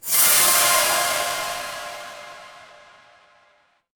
magic scifi spell

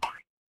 cartoon bonk

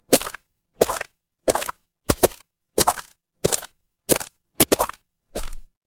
footsteps gravel

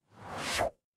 ui swipe

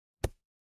impact punch

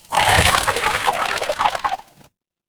water swirl

